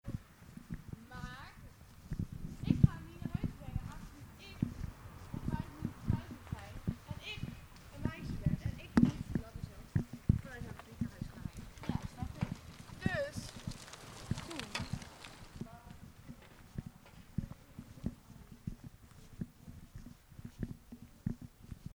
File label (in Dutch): meisjes voorbij fietsen